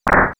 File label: door; SNES; SMRPG